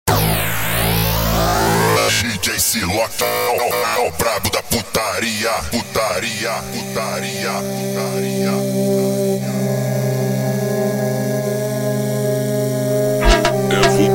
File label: Alien Horror Funk super Slowed